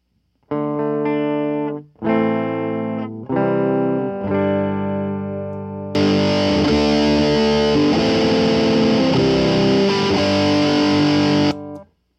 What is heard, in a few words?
Chord Comparison